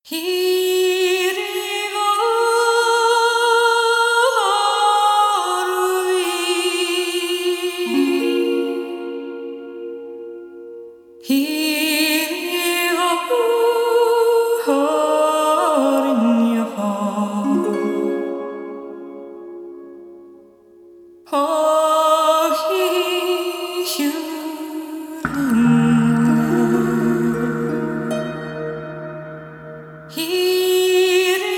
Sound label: Celtic Dream